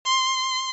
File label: violin; TamTamMicro.activity